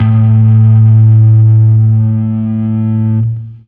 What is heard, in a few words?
Fuzz A harm